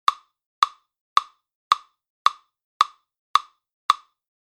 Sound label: Footstep Sounds as Rhythmic Auditory Stimulation; Gait Rehabilitation in Parkinsons Disease